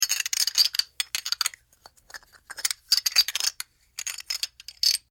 Metal Scrape Foley Rub Small; Metal Scraping Builds To Crescendo With Impact At End Horror

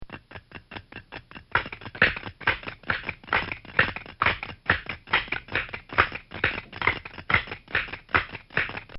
Cartoon Sound Effects Gallops; Cartoon Sound Design

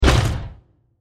Small Bursting Impact; Impacts; Bursts; Crafted Signals

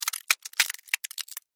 Weather Ice Crack; Snow

